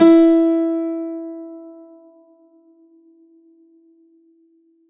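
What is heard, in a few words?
dulcimer E4, Tuned Percussion; Polyend; Simulacrum Sounds; 1 Shots; Acoustic and Electric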